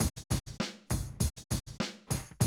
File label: drum loop, 100 BPM